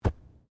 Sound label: Body movement Organic Impact Light